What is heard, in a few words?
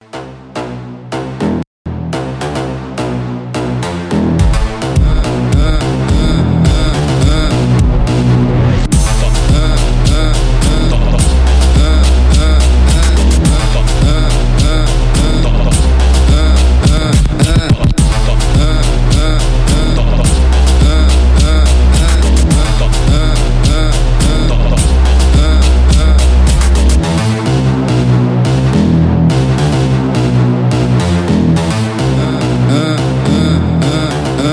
Kazakh Phonk